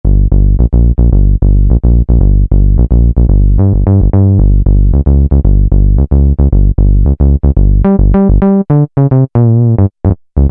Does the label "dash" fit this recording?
No